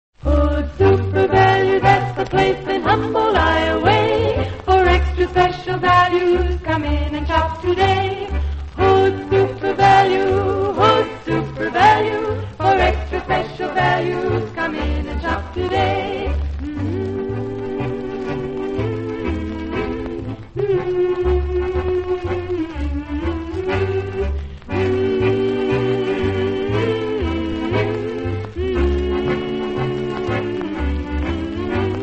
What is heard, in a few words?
radio jingles; hoods super value; allied radio artists